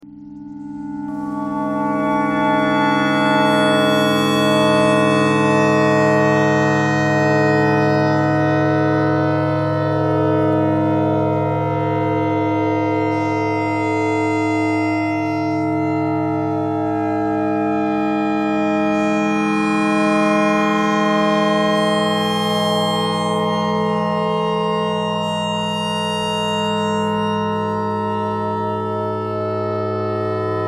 clarinet notes e clarinet thread noise

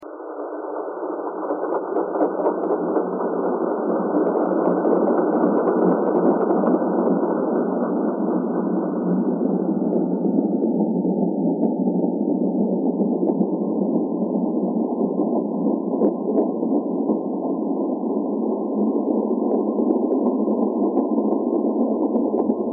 thread pentatonic

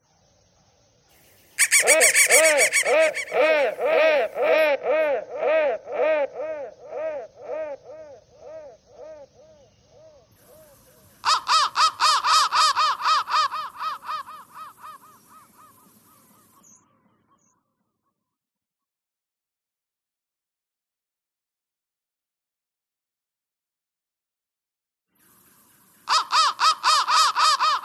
crow thread noise

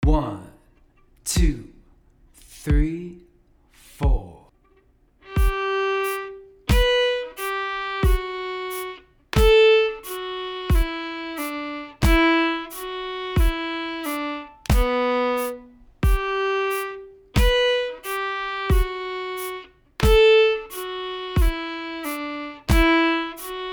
shuffle exercise

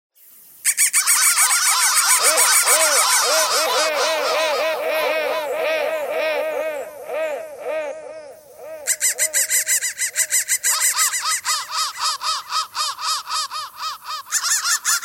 crow crow thread noise

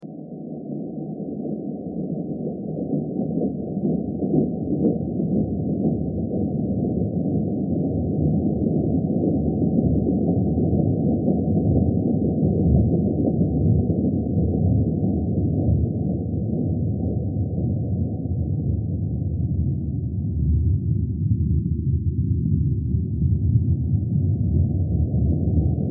thread simple

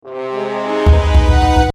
Fight In

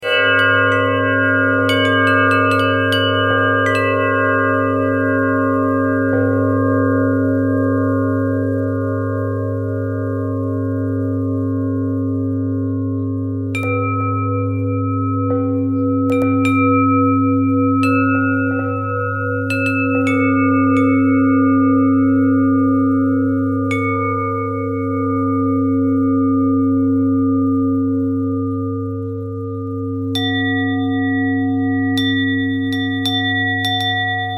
bell bend twist, bells

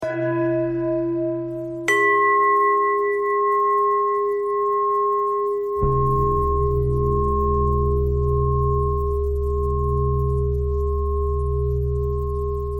bell thread pentatonic

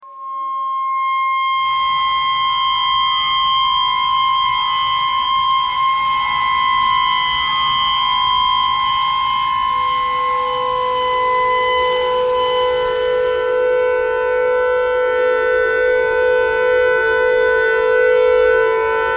tornado siren clarinet notes e noise

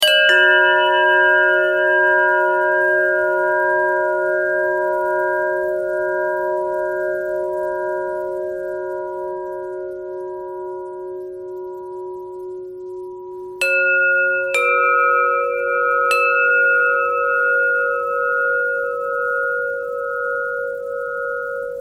bell thread

